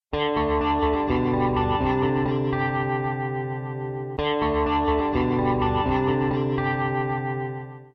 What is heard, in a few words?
Ringtone; win7